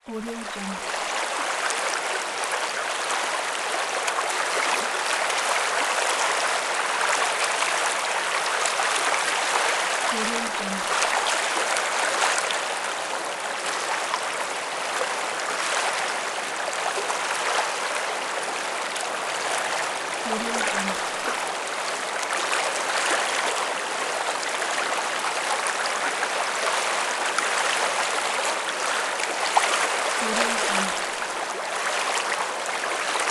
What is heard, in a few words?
beach waves